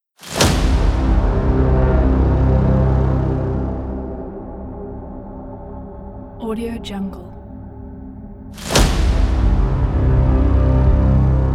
medieval viking trailer

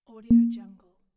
button click

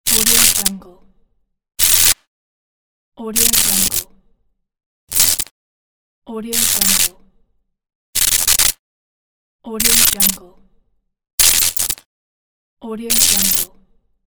electric zap pack

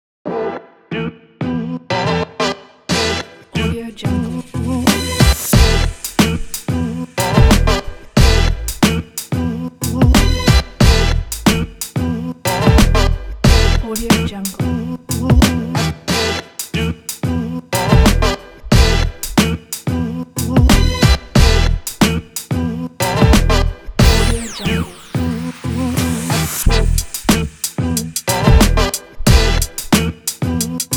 hiphop urban

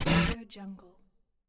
game short robotic sound

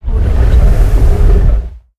big stone slide

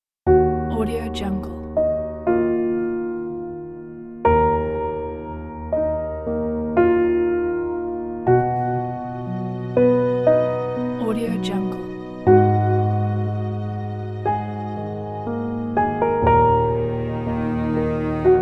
dramatic philosophic

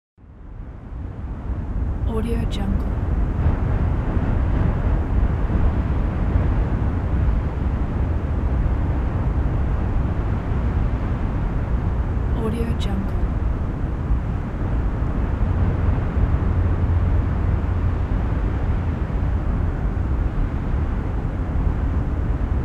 wind

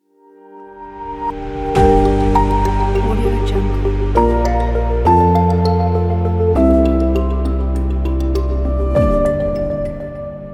for documentary logo

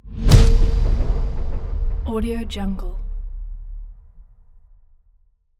movement

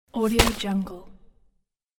falling sack of cement

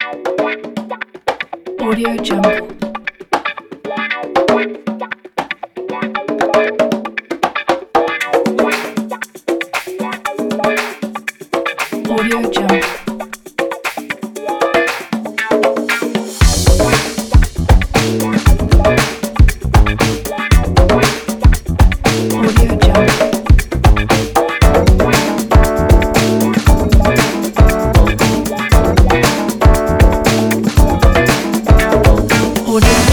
fancy cooking; pressmaster

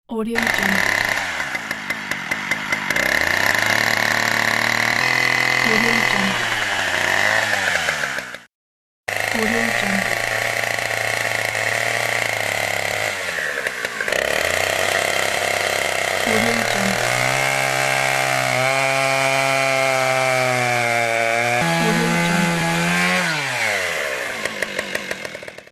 chainsaw

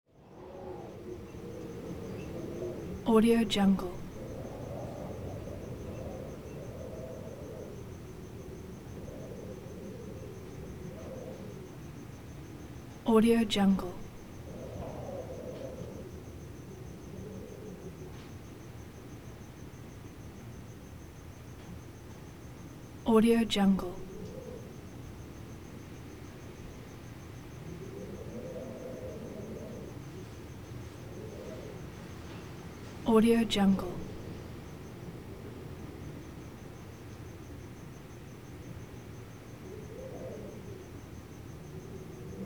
wind family room interior; tujunga

